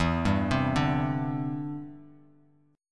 E Minor 7th Sharp 5th, down, Bass Standard

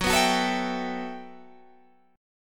G Major 13th

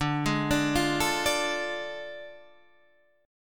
A Major 7th Suspended 4th Sharp 5th

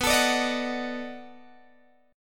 B 7th Sharp 9th, down, Guitar, Open G

